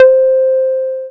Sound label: Dance Floor Bass, Multisample Basses